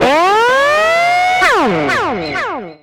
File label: Guitar, Trip-Hop Loops